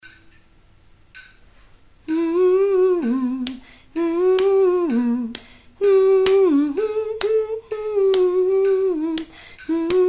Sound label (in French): c'est une chanson alto